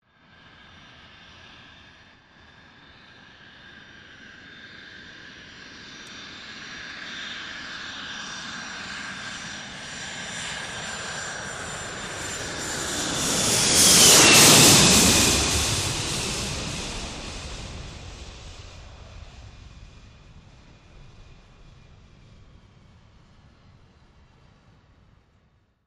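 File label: Large Jet Pass Low Land, jets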